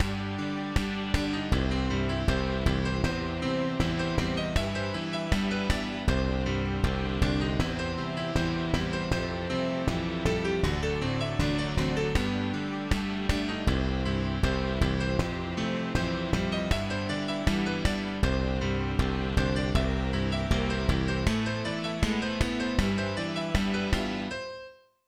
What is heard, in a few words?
entertainer Band